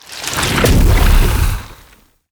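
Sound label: skill poison explosion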